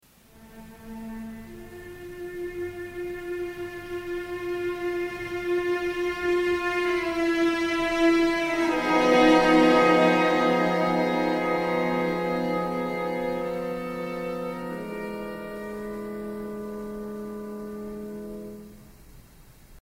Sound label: accord tristan; romantisme; opera